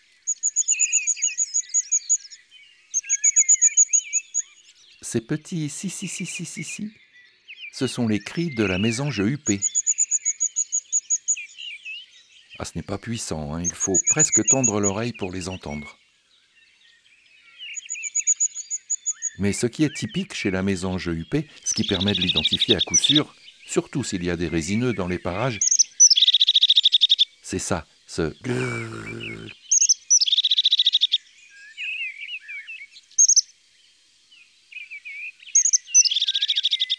Mesange huppee; ABC des chants d'oiseaux

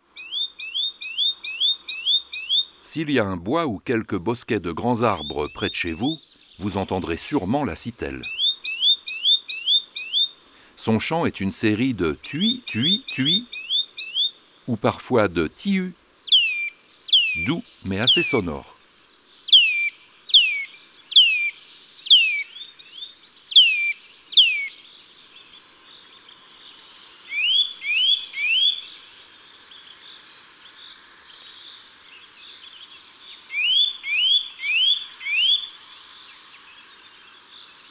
Sitelle torchepot; oiseaux des jardins

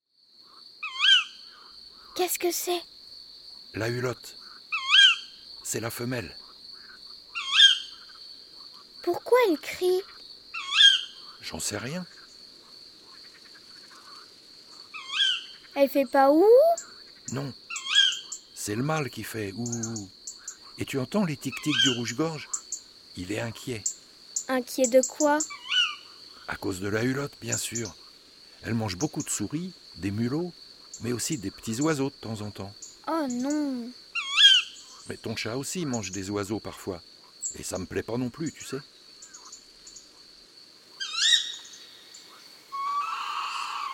La hulotte; Balade

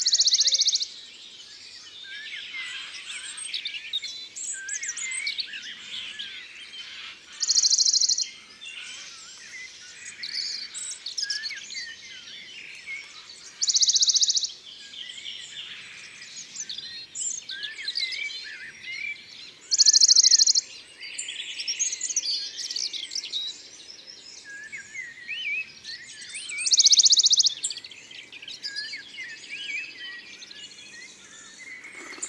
Pouillot de Bonelli; ABC des chants d oiseaux

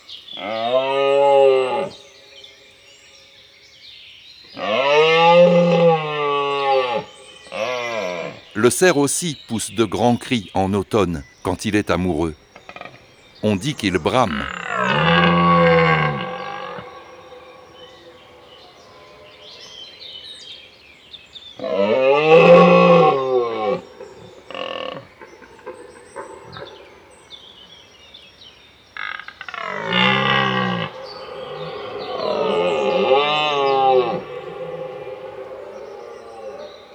Imagier Cerf

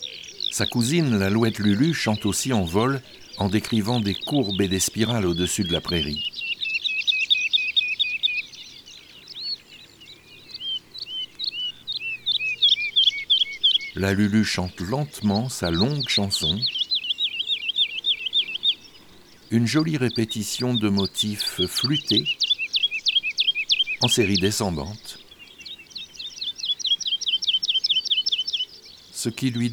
Mars L Alouette lulu; Les 4 saisons des chants d'oiseaux

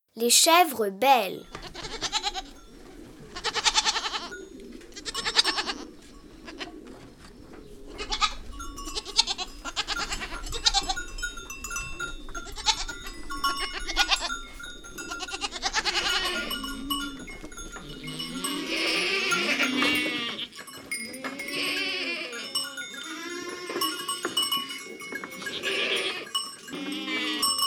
La chevre et son chevreau

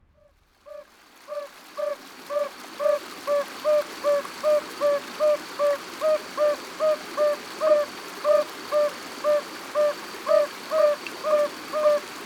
Crapeauds sonneurs a ventre jaune vallee de la Glueyre Ambiances Sonores d'Ardeche

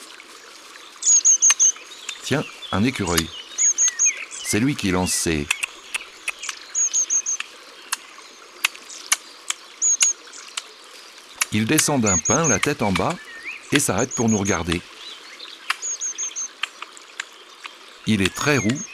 Ecureuil; ABC de la nature

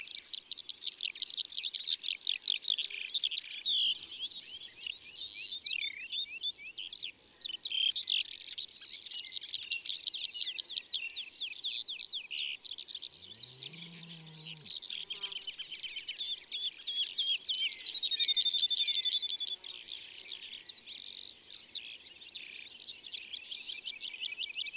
Bruant jaune ABC des chants d'oiseaux

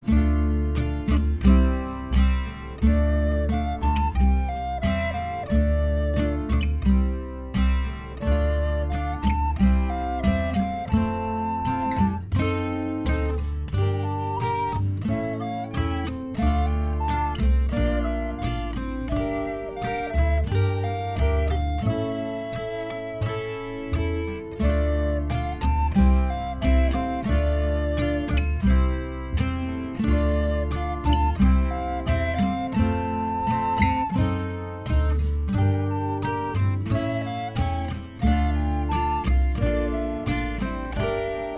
La cabane des bergers Instrumentale

